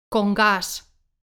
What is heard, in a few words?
congas